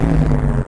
hurt

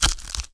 hurt

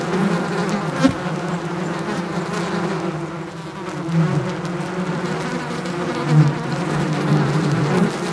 FLIES

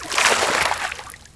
swim climb out

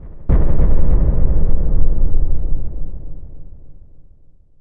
percussion

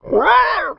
hurt

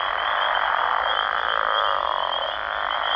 frogs and insects

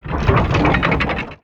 STOMPER WHEELS

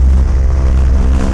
wings

